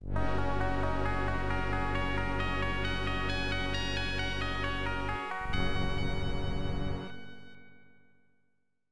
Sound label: Game Start; pro mahjong kiwame; snes